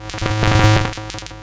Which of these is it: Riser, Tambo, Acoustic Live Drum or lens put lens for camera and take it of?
Riser